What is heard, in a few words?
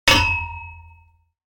metal pipe hit